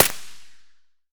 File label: ak74 silencer distant, weapons; STALKER; IWP